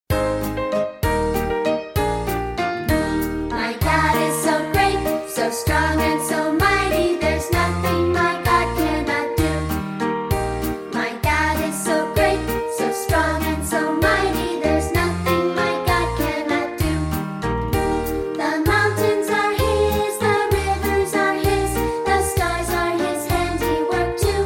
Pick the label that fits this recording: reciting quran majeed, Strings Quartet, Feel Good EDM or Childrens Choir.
Childrens Choir